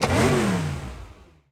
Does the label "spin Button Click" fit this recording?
Yes